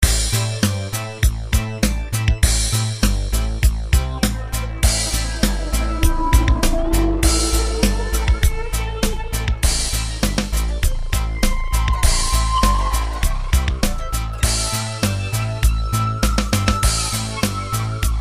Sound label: music